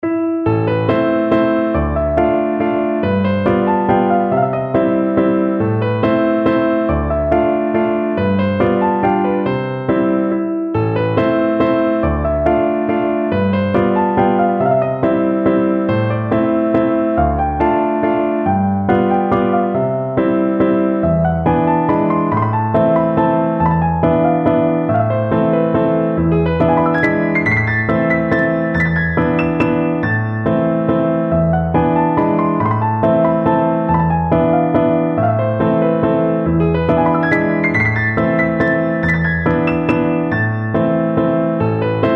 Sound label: piano